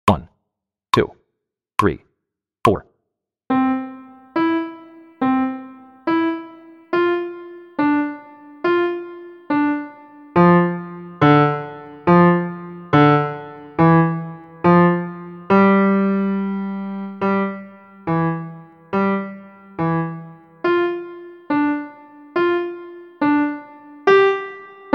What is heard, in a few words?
Just Bounce 70 BPM